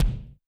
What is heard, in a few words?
BD Dry